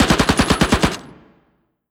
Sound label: infantry fire mg standing; Hearts of Iron IV Death or Dishonor